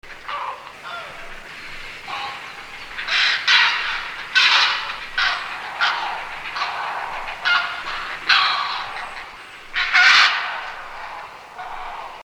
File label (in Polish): czapla